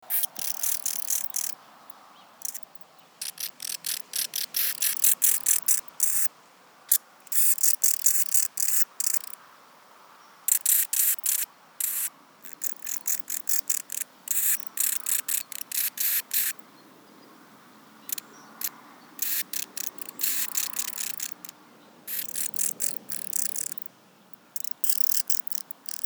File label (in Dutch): vleermuis; ringtone